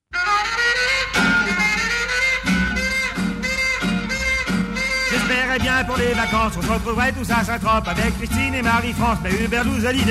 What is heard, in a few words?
rock